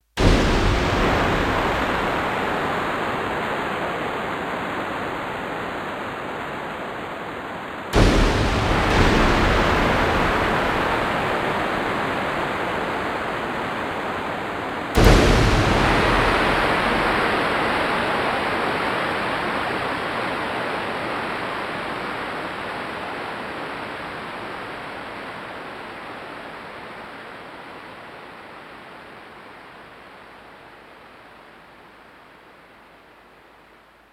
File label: Stormy Sunday, Roland D-10; Factory Patches